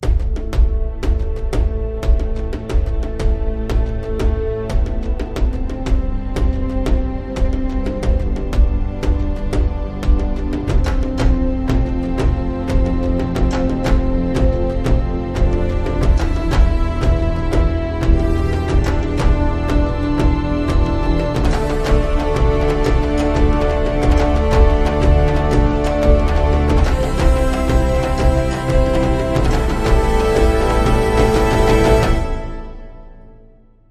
Alt Percussion

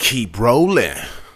Rap Vox

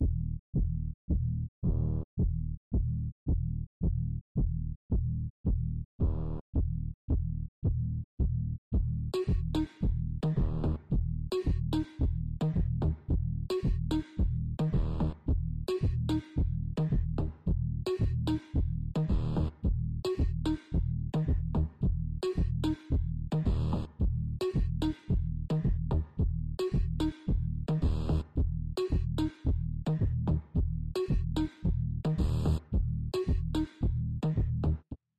House Synth 110bpm

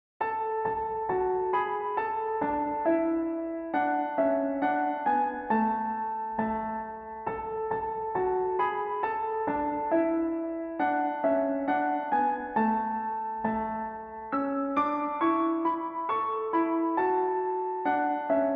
Good King Wenceslaus Piano Version; bbc

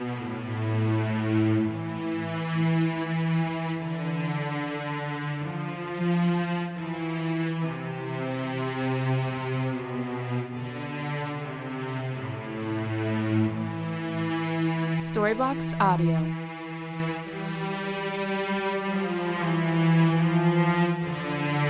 080BPM Amin Cinematic Cello

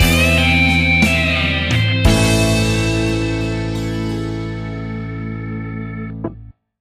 Home Town Blues Stinger; bbc

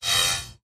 digital whoosh

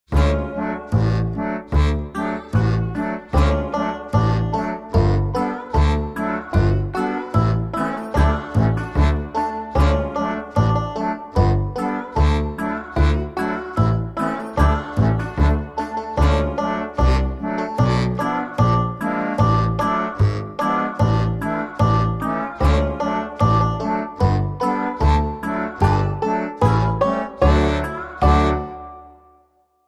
Old Macdonald Quirky

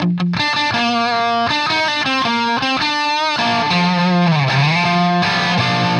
rock guitar

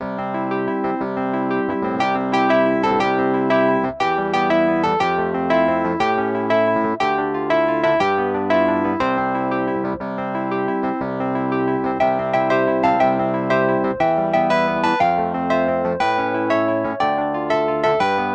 ring around the rosy wurlitzer

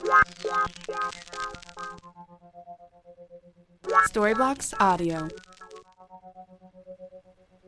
Ambient House Synth Twang 125BPM F

